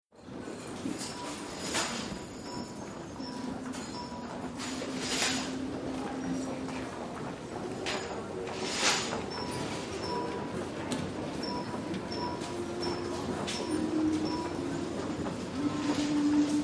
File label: CROWD -STORES AND HALLS DEPT. STORE: Crowds in department store, footsteps.